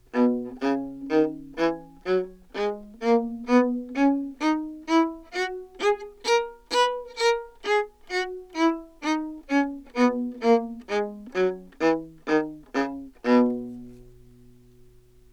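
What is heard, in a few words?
baroque viola scale heel lift, Anechoic; OPENAIR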